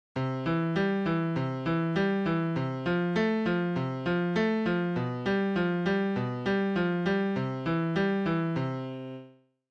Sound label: Alberti bass 4-4; 3-4 equivalents